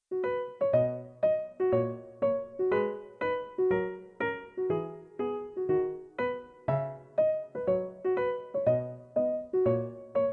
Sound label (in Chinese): 背景bgm